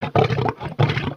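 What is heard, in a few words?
Pets Triceratops Happy; creatures pets; Lego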